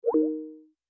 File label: Call Ended; Teams